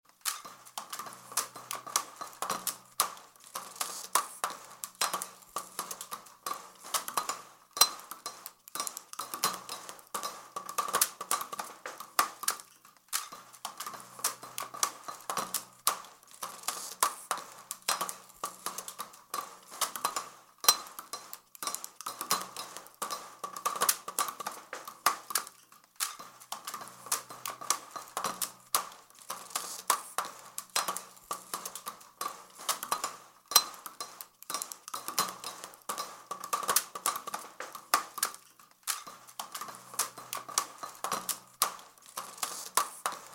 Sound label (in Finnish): karaokelaite